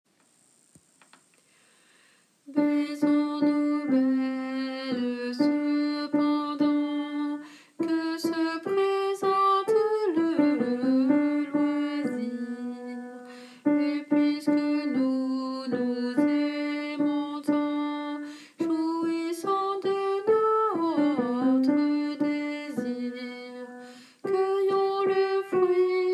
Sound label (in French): baisons nous alto; Baisons nous belle; Adrian Leroy; Second livre de chansons Leroy & Ballard1564